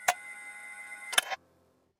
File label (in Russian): rover kamera povorachivaetsya